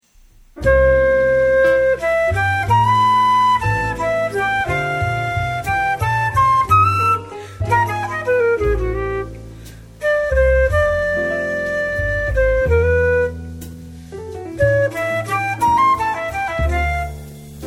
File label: ballad